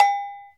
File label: TOTOSAN MUTE, Percussion; ILIO; Synclavier Percussion 2; World & Orchestral